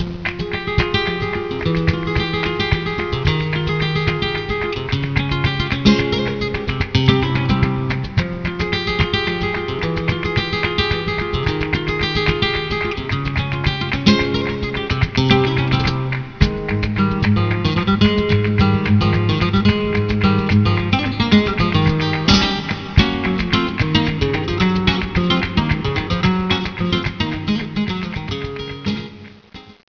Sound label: flamenco